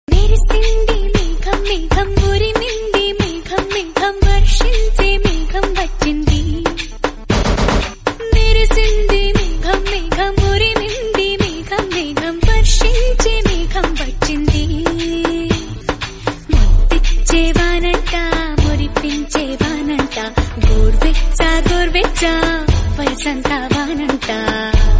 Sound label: Telugu Song Ringtone